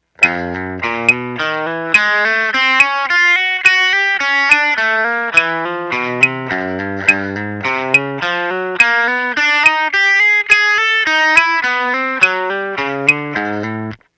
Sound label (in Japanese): ハンマリング練習